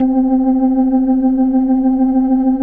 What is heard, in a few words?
C4F, B3, Vintage Keyboards